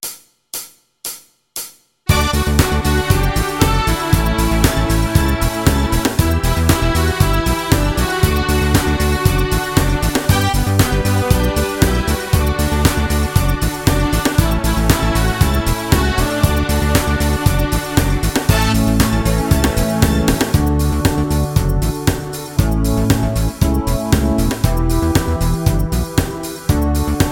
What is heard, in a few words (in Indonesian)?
Instrumen Aturan Di Jalan